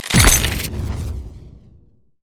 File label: stacked deck; LoL; twistedfate